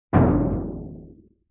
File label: Timpani Hit Bright; Vintage Cartoon; Drums & Percussion